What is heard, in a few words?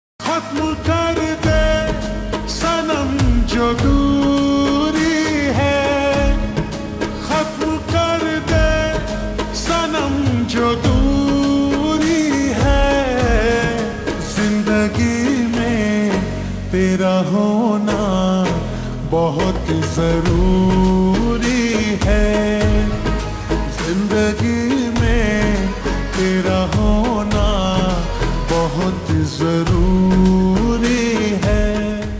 Ringtone Slowed Reverb